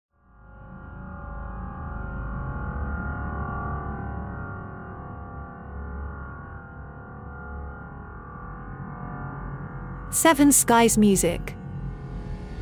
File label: Dark Ambience